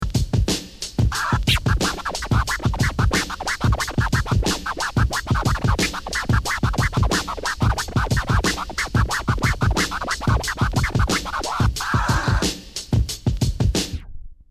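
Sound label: twiddle beat, scratch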